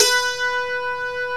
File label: DULCIMR KOTO; OMI - Universe of Sounds